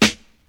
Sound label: acoustic snare a key; tuned snare